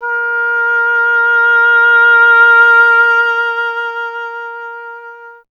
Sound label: oboe A; Miroslav Vitous; Solo Instruments VOL-2